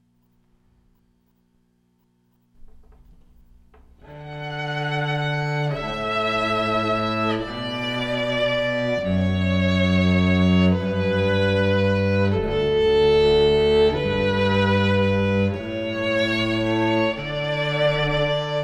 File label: Canon in D Duo